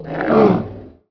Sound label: punch; unreal; titan